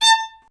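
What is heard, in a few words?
STR violin; Miroslav Vitous; Solo Instruments VOL-2; Solo lnst. II; Vln Misc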